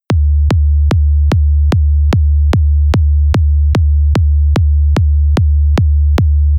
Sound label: Energyball demonstration kick